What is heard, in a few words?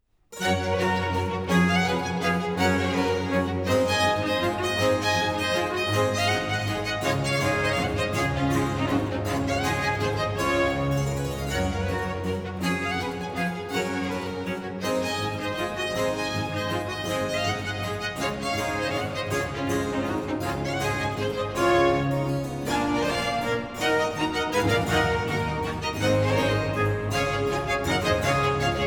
Concerto for Strings in G Major, Adagio